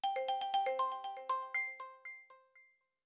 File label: nokia 2600 thrill ringtone